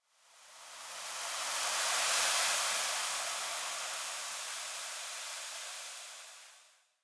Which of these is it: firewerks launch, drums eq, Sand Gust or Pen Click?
Sand Gust